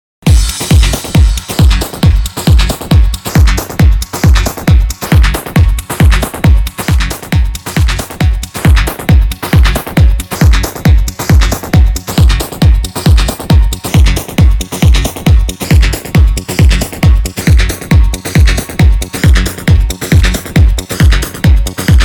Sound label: trance mix